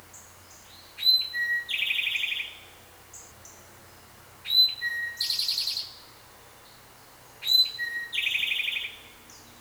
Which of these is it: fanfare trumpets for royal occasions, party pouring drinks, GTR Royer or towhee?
towhee